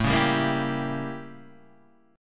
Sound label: A 7th Flat 9th Guitar Standard; A7b9 strummed; JGuitar